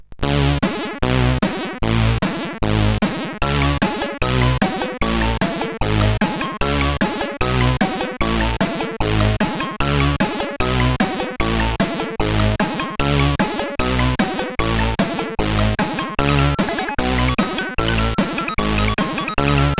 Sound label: Music, sid CSG8580R5